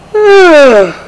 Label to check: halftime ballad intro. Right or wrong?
Wrong